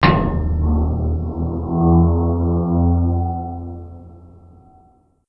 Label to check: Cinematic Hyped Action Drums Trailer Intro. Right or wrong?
Wrong